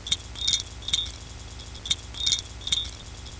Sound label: jingle; explorer